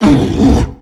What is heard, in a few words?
attack launch; Thalassophobia Restored; Humanapostle